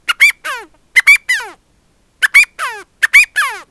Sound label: quail